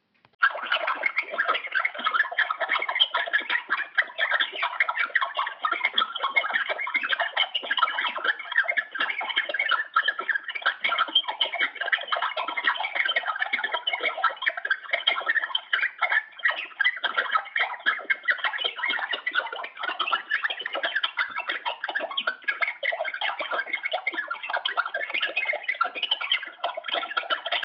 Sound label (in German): iphone 5s starke signalstoerungen beim telefonieren